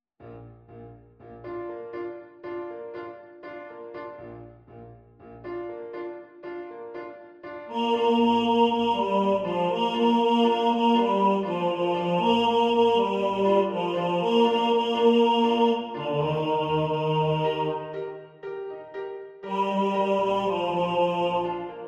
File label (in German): Tenor 1 im Vordergrund, Männerchor